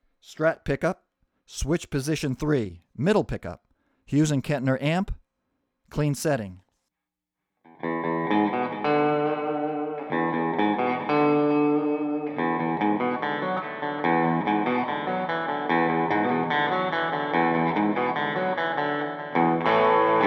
strat middle clean

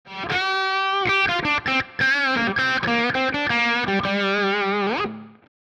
P90 Soap Solo, guitar

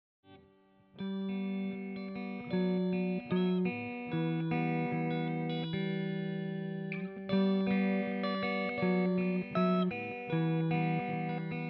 H540 clean song

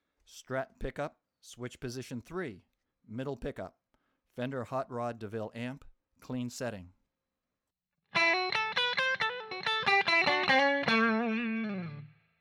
strat middle hotrod clean

